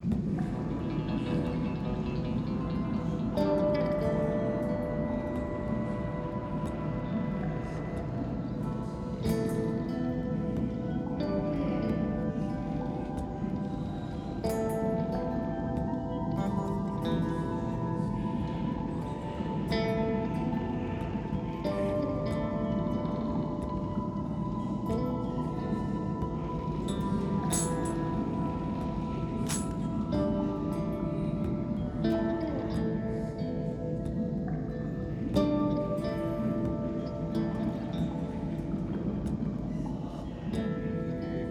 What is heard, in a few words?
Theme C (fade to drums and synth); mcsm music; Netflix